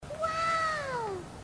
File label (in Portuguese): woooow sexy